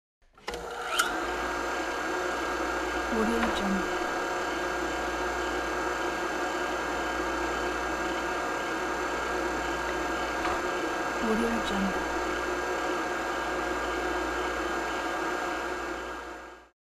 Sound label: woodworking machine